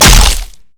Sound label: trollsmash oh; trundle; LoL